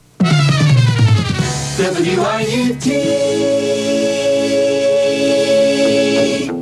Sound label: radio jingle; WRUT; Rutland, Vermont